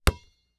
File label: Sports Basketball Bounce